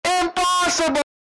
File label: distorted; gd